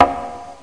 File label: puzl Wrng